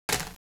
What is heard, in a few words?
Multi Roll; hyperspace-roll